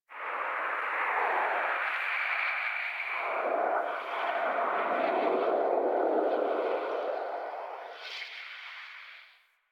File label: Venus Atmosphere Gust, Ambience; Warframe; Venus Open World; Venus Landscape